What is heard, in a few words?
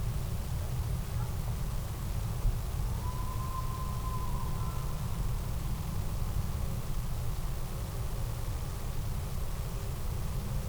howl